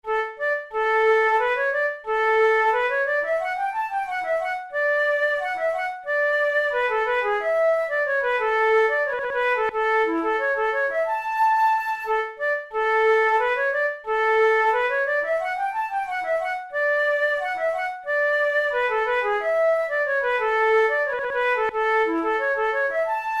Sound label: march in d major, flute